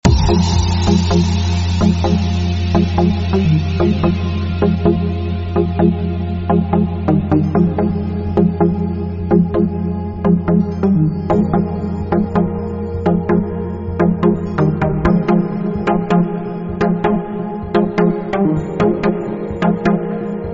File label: Electro